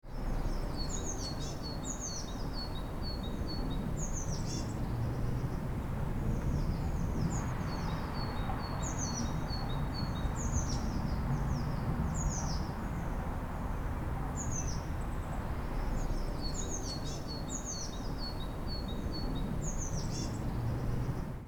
Suburban garden ambience backyard birds chirping

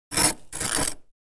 Ice scraping frozen ice block

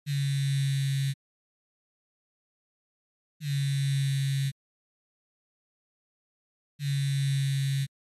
Phone vibrating incoming call x3

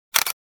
Camera shutter click taking picture

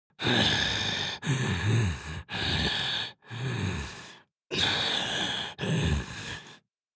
Heavy breathing anime villain vocalization

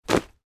Clothes dropped on the floor

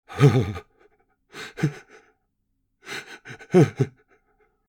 Senior male crying

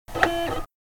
CD or DVD inserting a disc into player

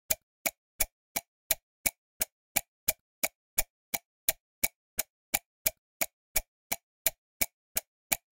Time passing fast clock tick tock

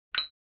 Zippo lighter open

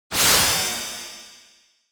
Sword attack anime fight

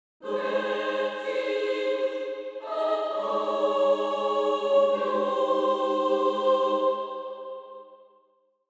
Angelic choir angels blessings upon you

